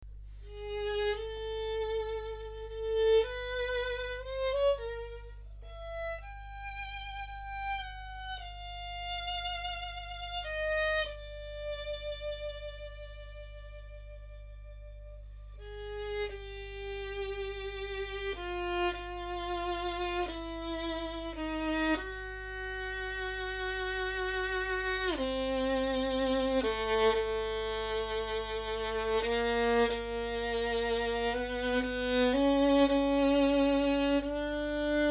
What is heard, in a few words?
violin